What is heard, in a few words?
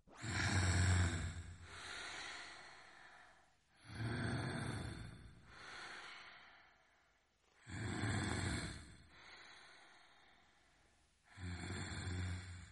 maniac breath